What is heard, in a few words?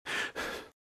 Breathe - Fast Heavy; RadwinIsland